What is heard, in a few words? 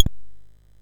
sid, MOS6581R4; Classic Concentration